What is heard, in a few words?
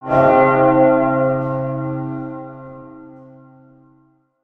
bells; destructibles